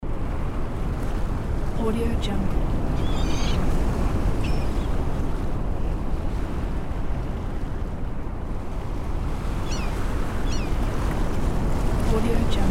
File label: ocean